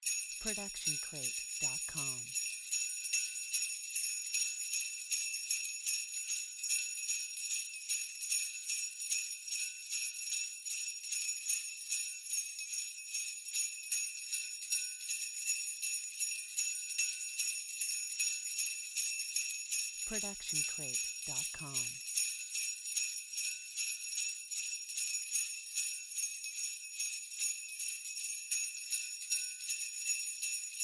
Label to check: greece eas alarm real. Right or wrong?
Wrong